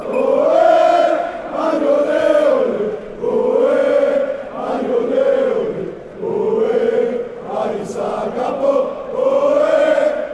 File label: aris chant